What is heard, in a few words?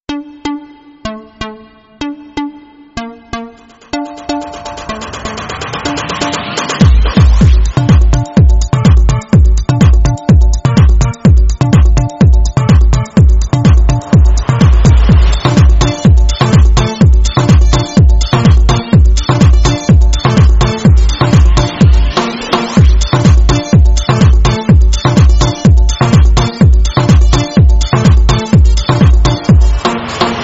Electro